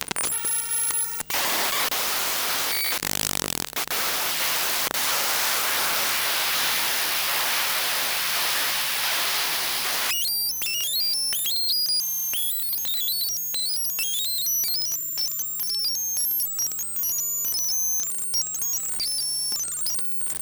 T3 Portable Connected, EM Pickup

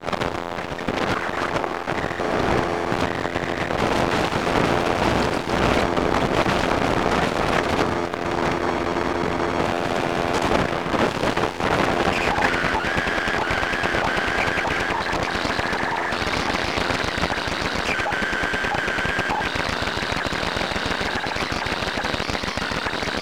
MBA resuming Win7 VM, quitting VM again; EM Pickup

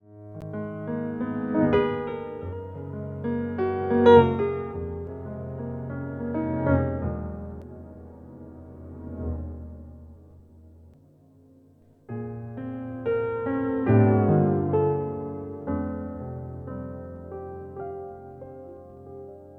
Yamaha C7 through Waves Doppler; Keyscape

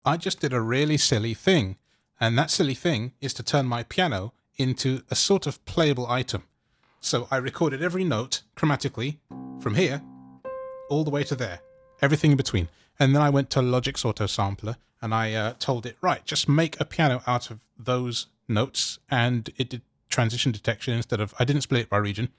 Lazy Piano

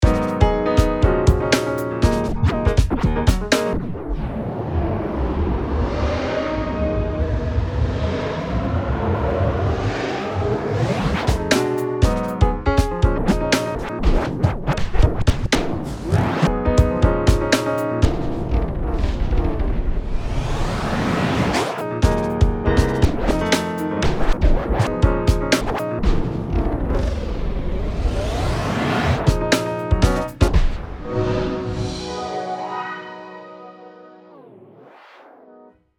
Explode the Modwheel

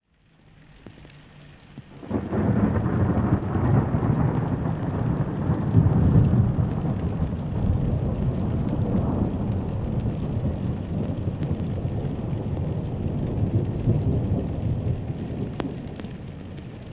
Thunderous Boom; Midnight

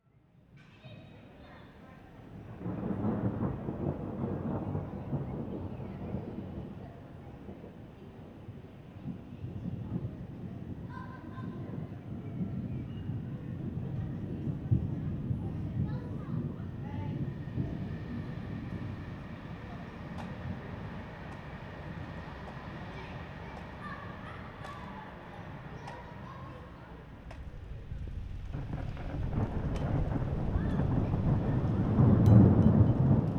Thunder and Ambiance; weathersounds

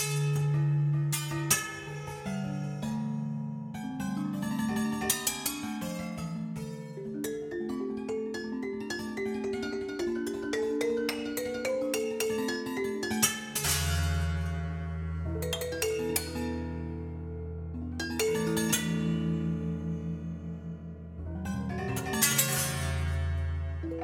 Piano Colors Experimental Grand

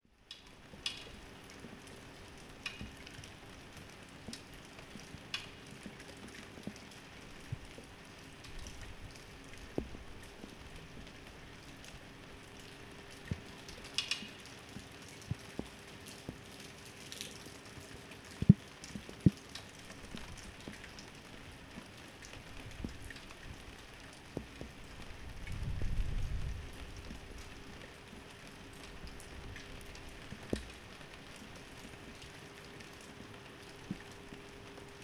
Garden Rain; weathersounds

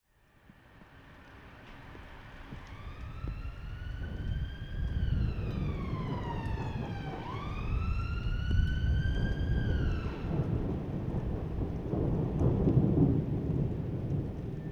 15 Minutes of Thunder, weathersounds

